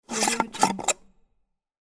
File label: jar lid